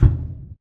footstep jog; Titanfall 2

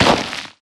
Mvmt FS Jog Dirt Layer; AI Stalker; Titanfall 2

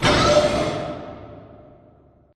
Prop Rack Start Close; Prop Warehouse; Titanfall 2; Boomtown

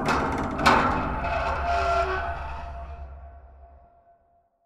metal stress med; Titanfall 2

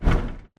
bt footstep jog parts; Titanfall 2

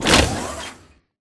Sentry Turret Leg Servo; Boost Card; Titanfall 2

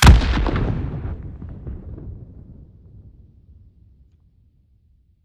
Nuke Destruct Explo Mid; AI Stalker; Titanfall 2

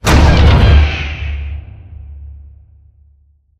Prop Warehouse Giant Door Close Start; Titanfall 2; Boomtown

